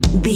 club jungle sting, Dance, EDM, Club, Disco, Eurodance, Confident, Energetic; Exercise, Aerobic, Aerobics, Spinning, Workout